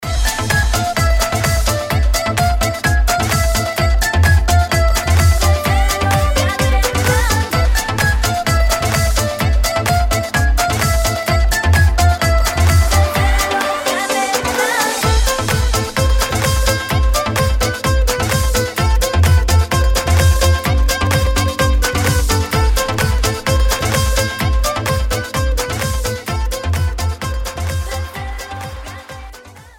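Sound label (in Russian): красивый проигрыш на инструменте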